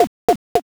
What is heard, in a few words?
hammer